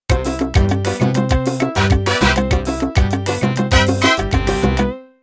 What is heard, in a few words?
Mambo Intro